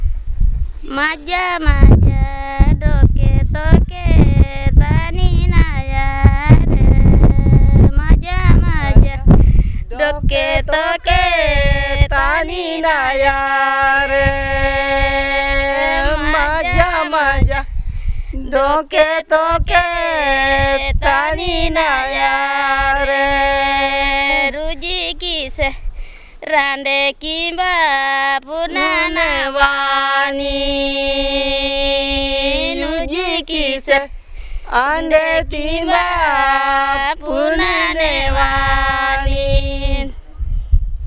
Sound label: Paharia song, malto; songs recorded in Pakur, 10 Feb, 2005